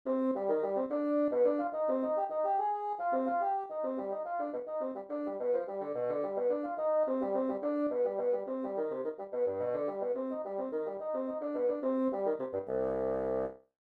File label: etude melody